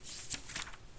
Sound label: Book; Feel; NiceVibrations